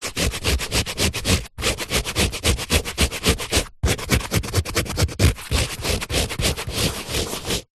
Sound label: paper fingernails scratches sheet of paper close up; zapsplat; foley